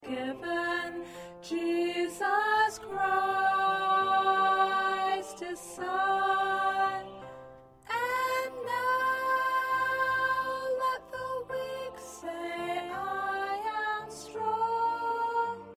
Soprano, choir

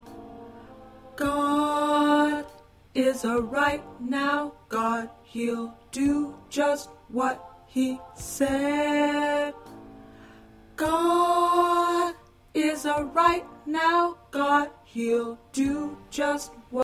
Tenor, choir

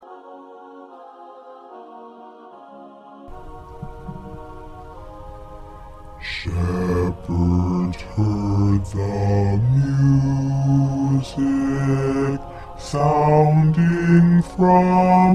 Bass; Bass 2 part preview; christmas songs for choir

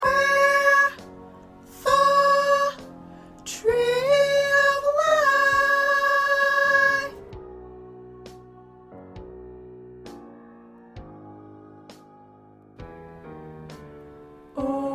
Soprano, gospel songs; practice tracks

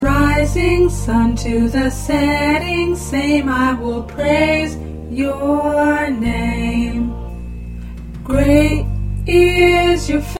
Alto

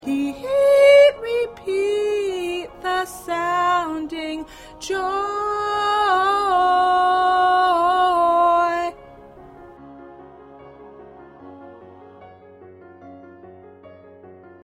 High Lead, choir

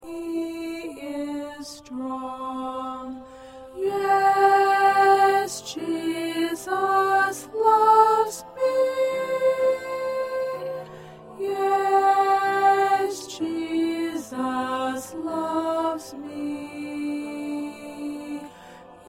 Soprano

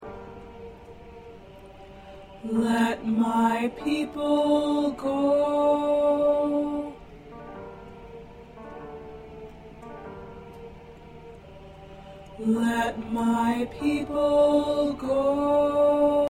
SATB Alto

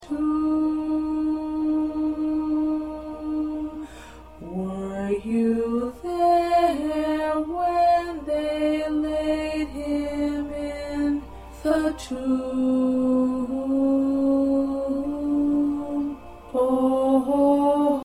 Alto 1A

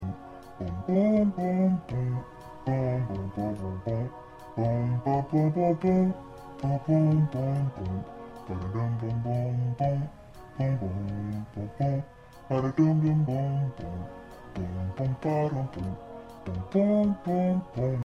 Bass, choir parts

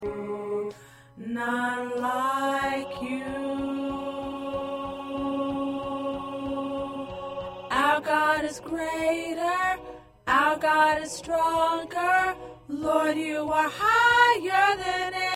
Soprano

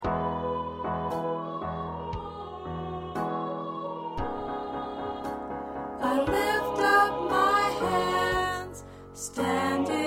Alto, choir parts

